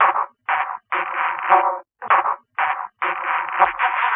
Horn Spit, Woodwind

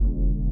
LIQUITAR; Spectrasonics; Metamorphosis

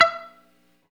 GTR Mute; 90's Sample CDs; Club-50 - Foundations Roland; Funk & Mute; Chunk Mute

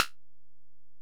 HiHat, Alesis DM-PRO

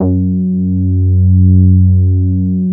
SB HORN; Wizoo - Powered Nord; STEREO LAYER

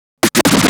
Scratch Fills 140BPM

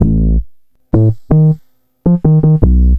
Bass, Futurist Drum & Bass

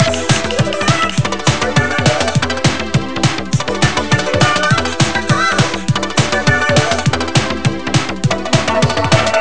muzak; ST-XX Amiga Soundtracker Sample Packs

